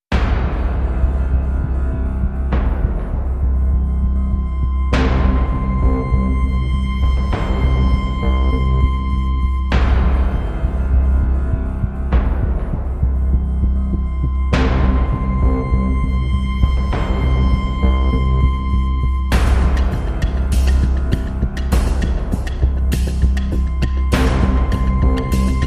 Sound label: No Strings